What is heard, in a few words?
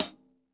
hihat - closed; JazzFunkKit